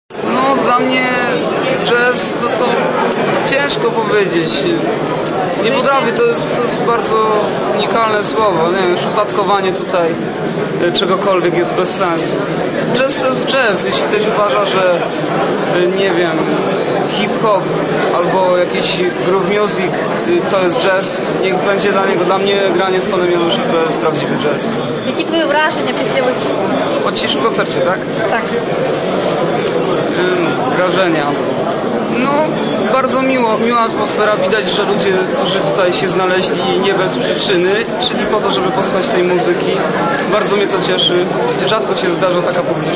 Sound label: piano